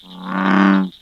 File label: Bullfrog